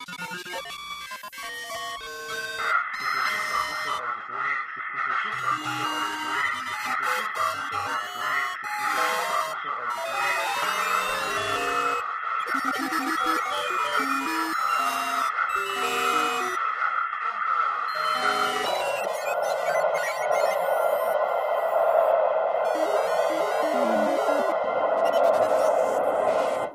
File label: COSMIC CODE, drones & tones